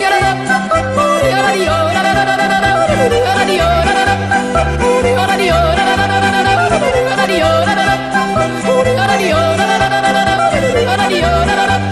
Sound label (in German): ku ku jodel